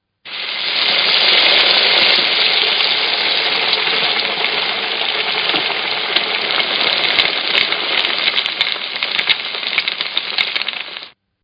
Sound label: FRY, food prep; Restaurants; Partners In Rhyme